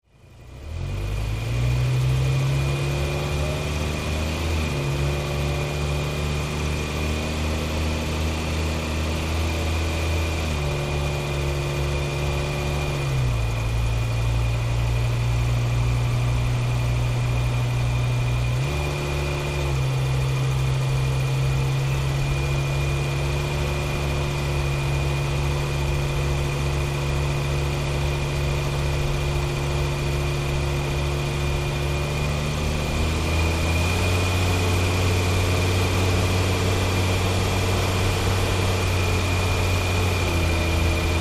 Dodge Ram: On Board Medium Driving. Mic Tail Pipe And Engine.